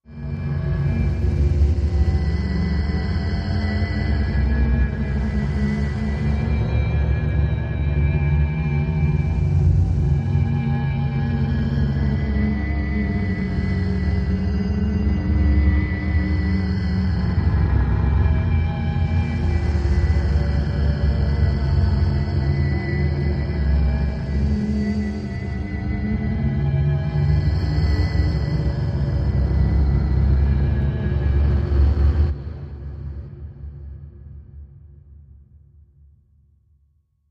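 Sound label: Creep Med Dark Eerie Cave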